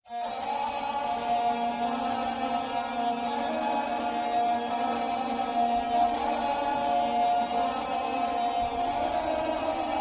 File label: Kurdish Elektro Beats